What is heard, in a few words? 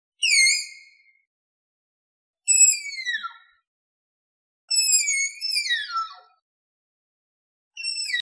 Malayan tapir; تاپیر مالایی